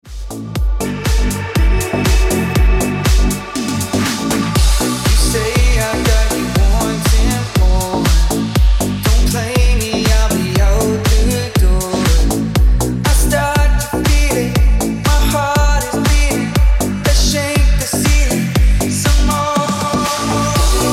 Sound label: Ringtone